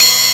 PERC METAL; Big Beat Samples & More; AKAI

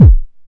BD ANALOG, Synth; Sound & Vision - Gigapack II CD 2

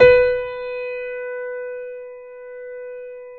B 3, Keyboards Acoustic, GRANDP ST; USB Soundscan vol.17

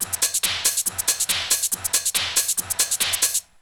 Essential beats; FM 57 - The Ultimate Sample Collection (1997); Repedative Nature(142), Psycho(140), Crowded(140)

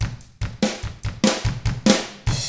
rock 6/8, drums; ILIO; Double Platinum Drums 1

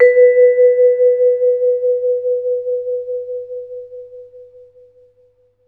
VB VIFS B3-R, VB VIB FS; Best Service; Peter Siedlaczek's Advanced Orchestra

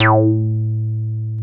303 REZ A2, ROLAND TB303; Big Fish Audio; First Call Vintage Keyboards

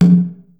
lo conga, Percussion; realdrum

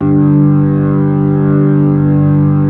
B-3, Keyboards

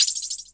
JD800 Internal Drums, Roland JD800

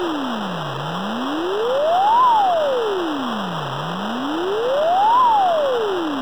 sci fi; Masterbits - Switch FX

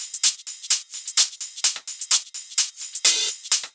Loops 128BPM, Drumloops

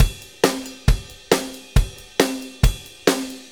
4-4 Loop, Drum Loops, Full Kit Loops; Drum Tools

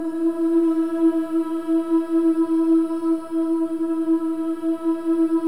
BOYS OOS; Spectrasonics; Symphony of Voices

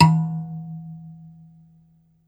BASS KALIM, Kalimbas; E-MU Producer Series Vol. 7 – Old World Instruments

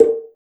RSS PERC

